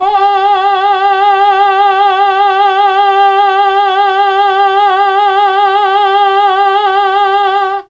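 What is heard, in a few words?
G3 chamber male, mellotron; CHMB MALE VC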